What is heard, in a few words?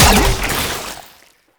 Infested Shotgun Alt Fire Mech; Weapons